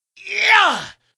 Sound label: Attack; Keleseth